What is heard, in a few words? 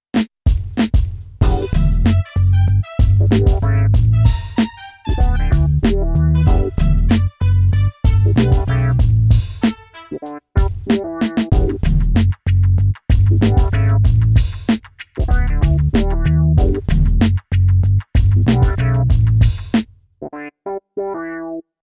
hiphop; 16-bit, 44.1 kHz stereo; xp-80; Multi-patch demo; roland; roland demo cd-3